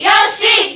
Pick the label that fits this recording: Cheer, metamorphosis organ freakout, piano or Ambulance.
Cheer